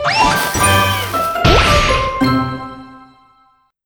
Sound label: Crunchy Finish